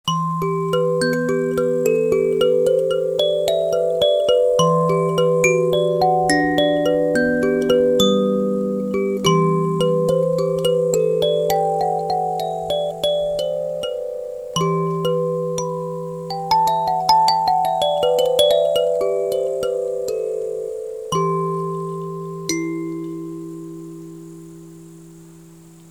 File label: Tuning F minor Kalimbas; F moll; 19 ton; Carpo Special